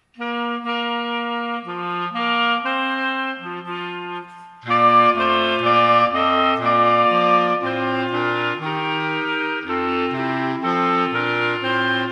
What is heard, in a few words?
Adeste clarinet